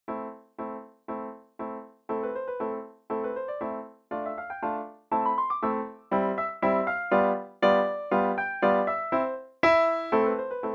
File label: arabesque piano